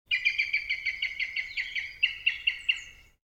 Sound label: Kestrel bird alert voice long full, american kestrel; usa; tiny raptor; the spirited american kestrel; big personality